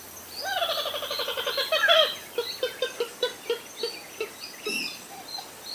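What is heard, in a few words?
Porphyrio martinicus, bird; گالینول بنفش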